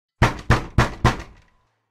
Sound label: Loud Knocking on Door Sound Effect